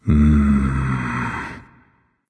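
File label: thinking, nonverbal; smite; khepri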